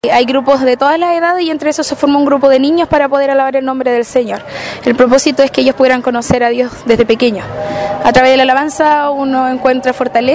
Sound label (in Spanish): coro